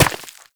Operator Run Rock, Footsteps; Warframe

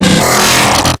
Mortar Fire; Warframe; Ambulas; Dialog Barks Battle

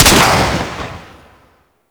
Swarm Missile Launcher Fire 3D; Warframe

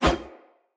Player Short Sword Whoosh; Weapons; Swords

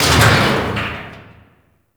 Elevator Stop; Ambience; Props; Gemini; Warframe; Entrati

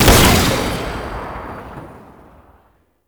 Concrete Archgun Charged Shot; Warframe; Archwing

